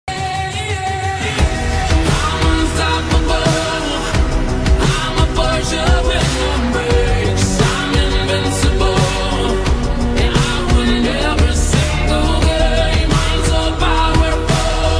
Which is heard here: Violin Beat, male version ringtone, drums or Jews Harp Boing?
male version ringtone